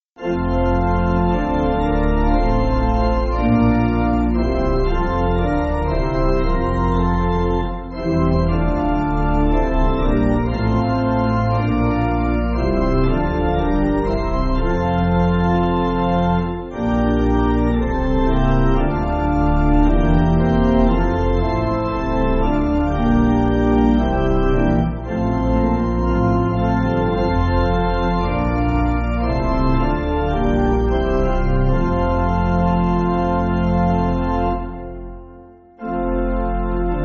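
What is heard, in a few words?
Pipe Organ, Free Music Backing Files for Churches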